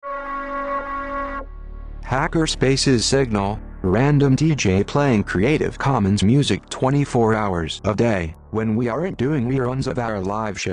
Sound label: jingle; Signal programming